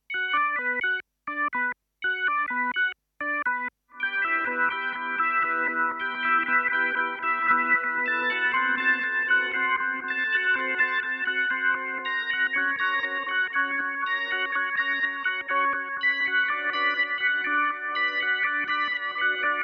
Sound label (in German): Strymon Time Line Tape Echo Orgel